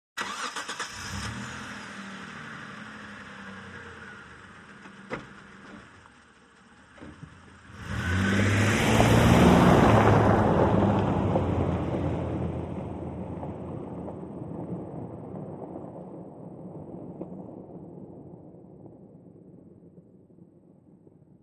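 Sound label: Montero Start Fast Away, 1998 mitsubishi montero ext.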